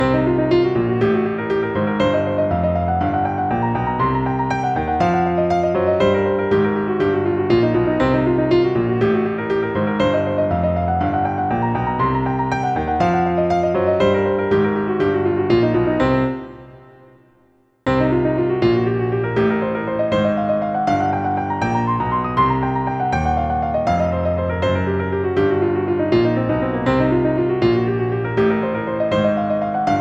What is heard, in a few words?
6-note Minor Pentatonic Blues Scale Exercise